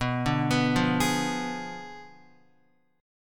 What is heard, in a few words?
B Diminished 7th, Guitar; Bdim7 arpeggiated; JGuitar